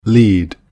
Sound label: lead